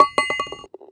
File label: Ball Sounds; Pangya; Fantasy Golf